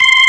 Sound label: B3 Lo Fast C5; Proteus 2000; Digital Sound Factory Proteus 2000